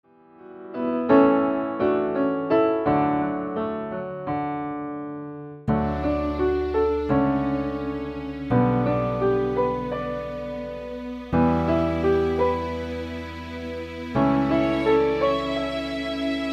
BACKGROUND MUSIC

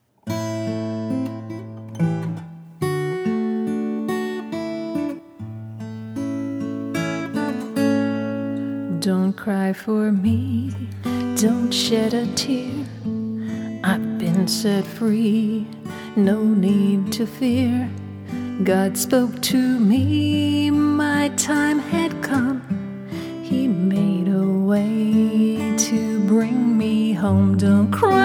Vocal with Harmony

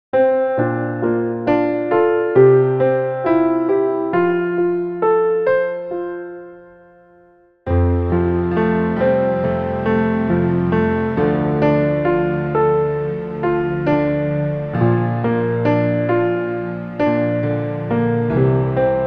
BACKGROUND MUSIC

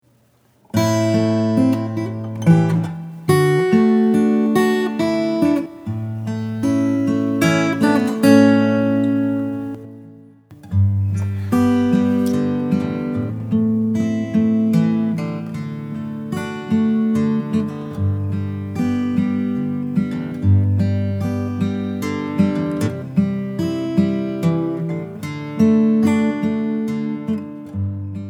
instrumental only

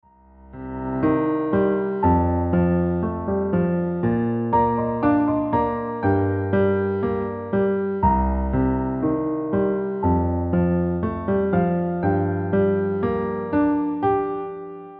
background music

